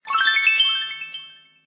jewel; Viking Escape

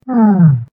death fly enemies; Viking Escape

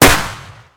shoot; DuckShoot

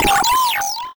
boss dead; Season Land

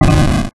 explosion; Jungle Treasure

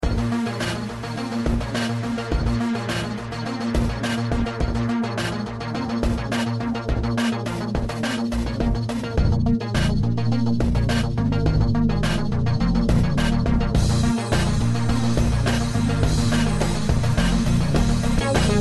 racing game music, sfx, and loops; Formula Drag

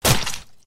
blood burst; Zombies Night

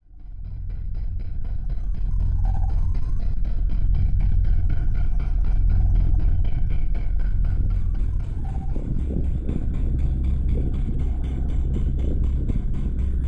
dark bg sfx; Zombies Night

